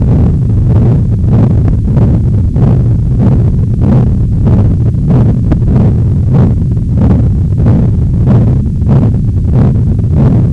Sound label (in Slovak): srdce; Vlastne kmitanie oscilatora